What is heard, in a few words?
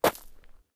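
gravel, steps; Terrarum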